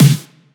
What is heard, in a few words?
NEON SNARE